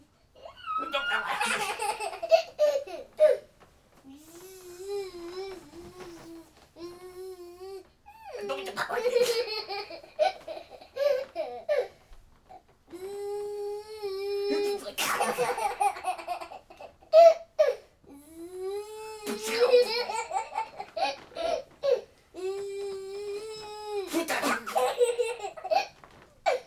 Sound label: Laughing